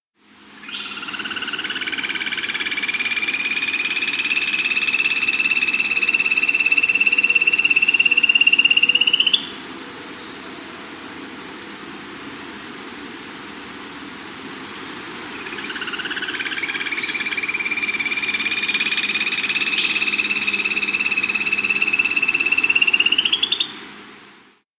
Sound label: Black-Cheeked Gnateater; S. America